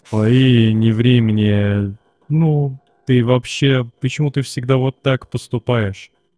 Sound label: Bark; Barkという音声合成AI